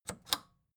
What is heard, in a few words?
record player power click off slow